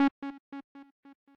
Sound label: arp